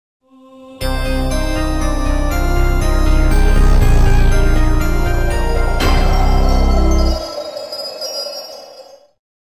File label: Logo (3DO Interactive Multiplayer Version); Electronic Arts